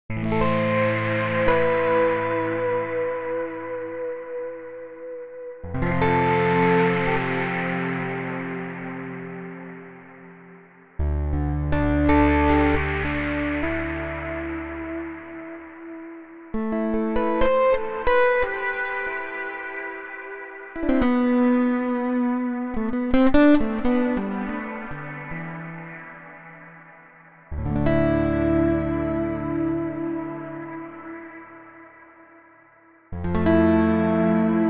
Korg Arp 2600 VST Guitar VS Guitar Rig

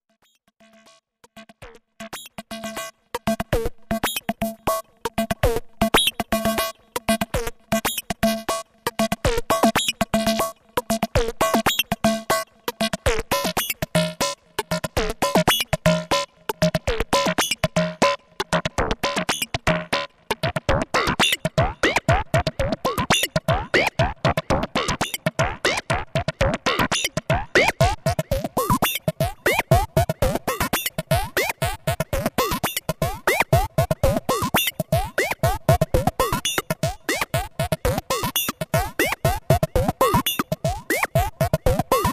sequ FX

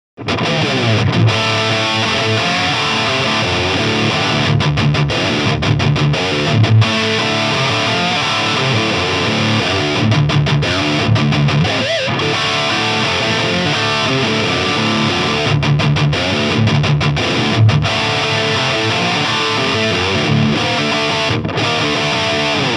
Hagstrom Super Swede Heavy Lead

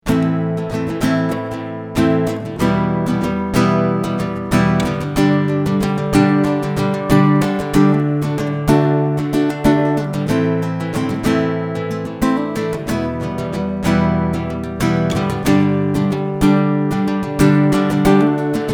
pop strumming

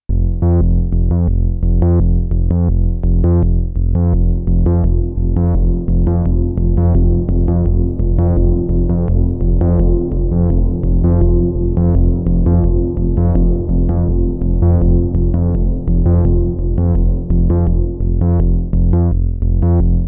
Spring Reverb; Model15

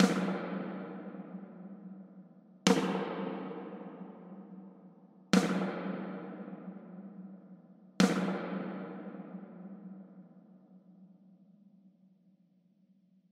Vermona DSR 3 Snare Bass 9 Treble 3 4

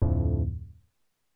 stab; Behringer UB XaD

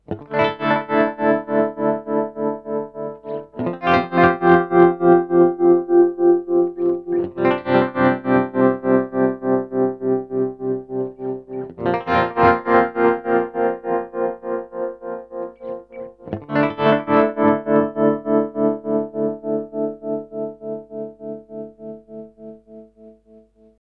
Delay Tremolo

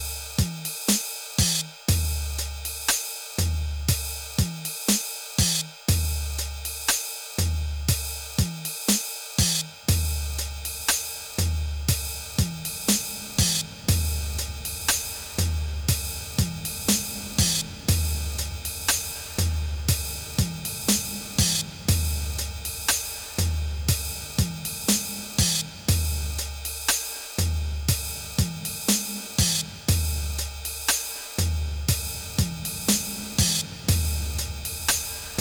Drum Submarine Reverb; NTS-3

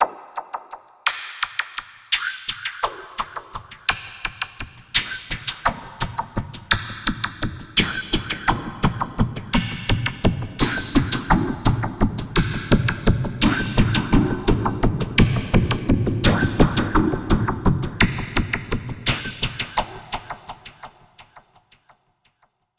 Reso Peaks; Synapse Audio The Legend HZ

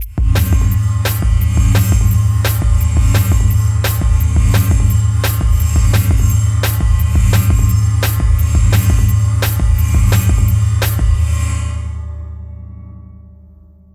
Gong Amp Beat Gong Trip Modulation; AudioThing Hainbach Bundle

